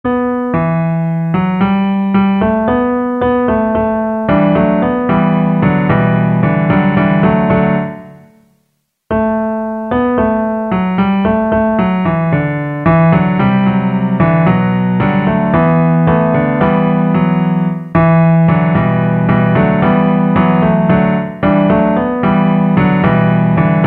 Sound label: Santianna Piano Full